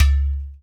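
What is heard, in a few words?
percussion udu; Roland LCDP14 Africa VOL-2; Afro Menu 1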